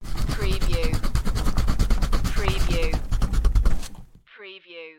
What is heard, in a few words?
Hard Erasing